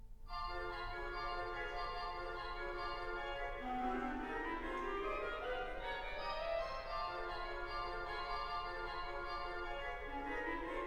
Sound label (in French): Gnomus (Transcription pour orgue; Tableaux d une exposition II.